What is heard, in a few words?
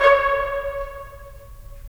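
brass trumpets staccato, left; Roland LA Composer vol. 4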